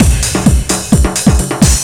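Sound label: drum loop 130, left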